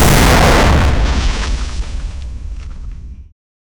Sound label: snow ice spell explosion